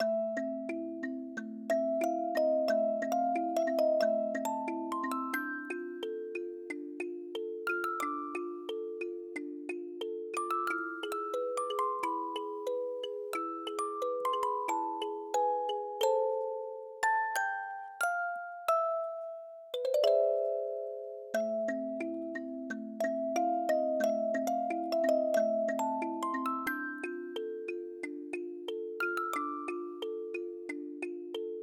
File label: Tango, Music Box